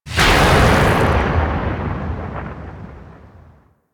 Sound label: Kraken Cast Impact; Warframe; Warframes; Pirate